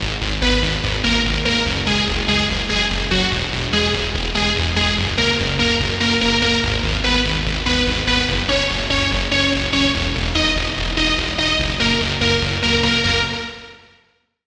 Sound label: Lead; Mind Cloud; Routed Sound; Vital Expansion - Reality Maker Vol.1